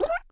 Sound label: Globe Bird Hit; Angry Birds Seasons